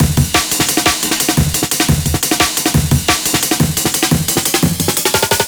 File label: amen; pendulamen